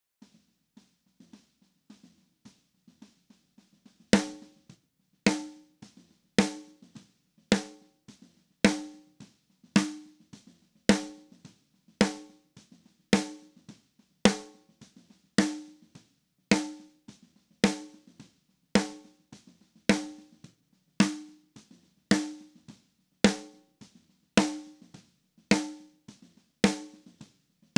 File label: snare; AugustRain